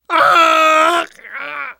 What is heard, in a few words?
death scream; Bill